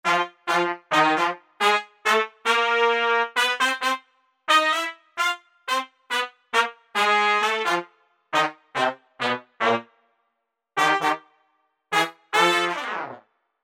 HORNS Trumpet Section; Apple GarageBand 2

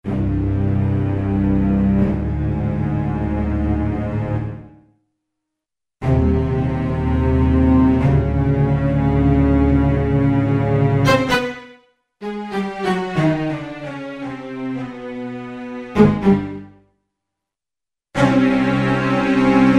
Bad Guy STR, Orchestral

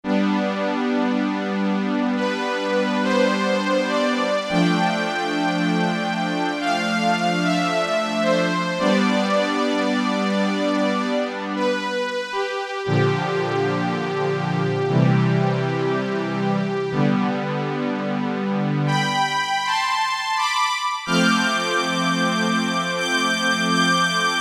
Strings, DWGS synthesizer presets; Korg DSS-1; Korg DSS-1 Sound Library

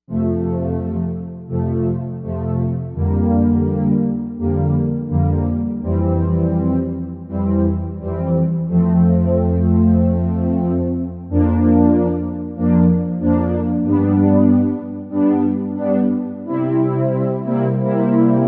Synth Orch, Novation UltraNova; Factory Patches

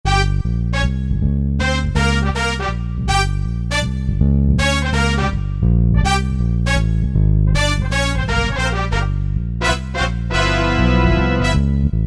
Bass Brass, Korg MSC-9S Organ

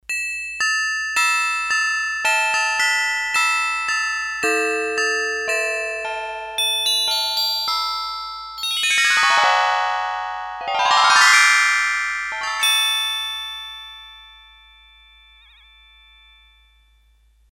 Bells, Korg DS-8; Factory Patches

